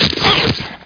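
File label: tackle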